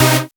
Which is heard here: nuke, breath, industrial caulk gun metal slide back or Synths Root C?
Synths Root C